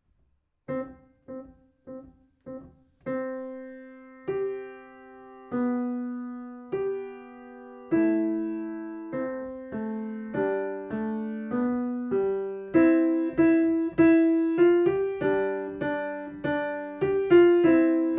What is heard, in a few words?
secondo; Turk - Spring Song; duets